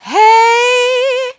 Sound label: adlib Hey Sung, Vocal, Dry Vocals; Raw Vocals; Slate Digital Omnivox Vocal Sample Pack; Adlibs